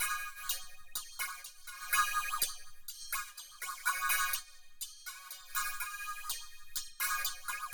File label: CYM, Ethno Techno; ILIO - Ethno Techno